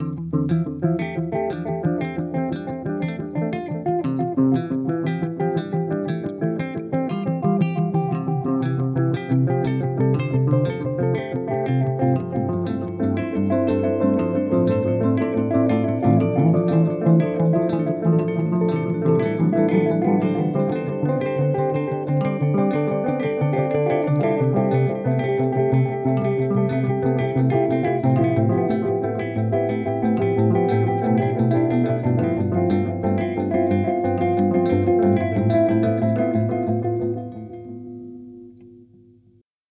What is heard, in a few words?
Analog Delay